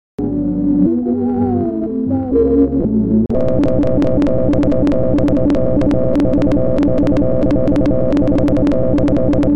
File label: field mine